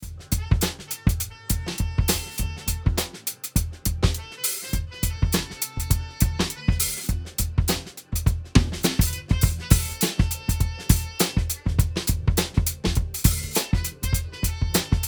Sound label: Drum Buss Drum Sharpener; Ableton